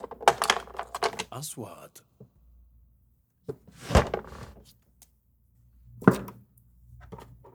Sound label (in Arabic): خبيط ادوات نجارة, woods; sound effect